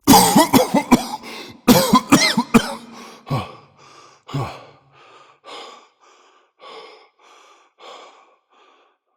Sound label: Cough; Warframe; Entrati Lab Quest; 2023 TennoCon; Arthur